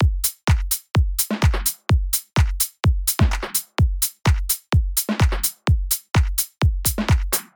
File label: layering